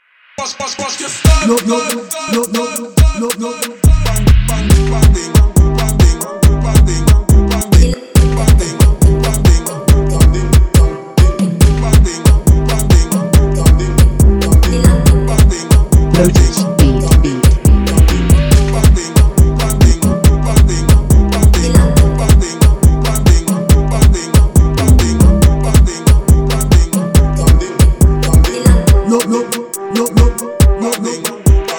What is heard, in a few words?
Afro Bong Bing